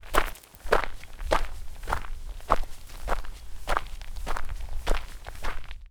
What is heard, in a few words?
Walk Gravel; Minora-Game; Sound-Artlist